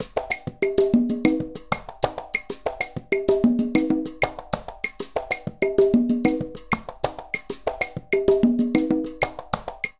Songo 1 A 96bpm, Latin Percussion; Ableton; Factory Packs

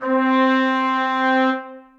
Brass Ensemble Legato, Orchestral Brass; Ableton

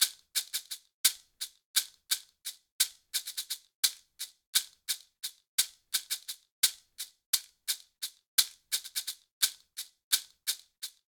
Bolero Maracas 86bpm; Latin Percussion; Ableton; Factory Packs

